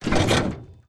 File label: snowmobile suspension light